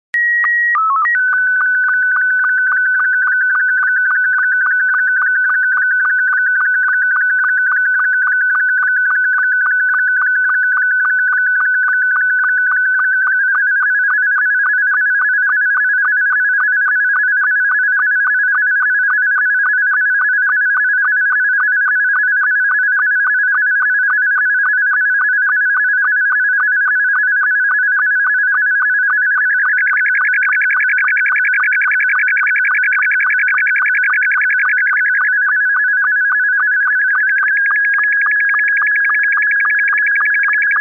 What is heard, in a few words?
sstv